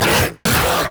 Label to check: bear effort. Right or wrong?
Right